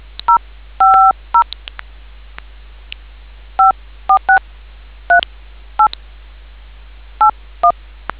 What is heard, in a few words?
dtmf 61427A